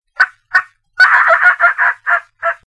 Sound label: Turkey Fly Down Cackle; ORION